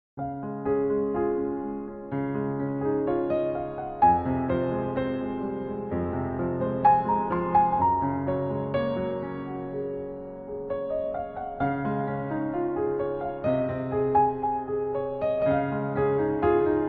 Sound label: A Short Beautiful Piano Piece Impov